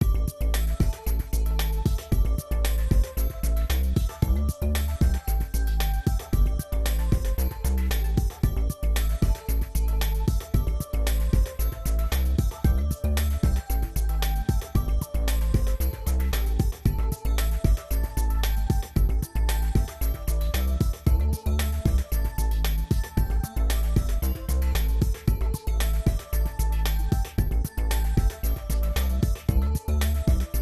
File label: Groove; Microsoft IntelliPoint